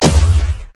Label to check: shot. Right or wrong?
Right